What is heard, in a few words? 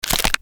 bone crack asmr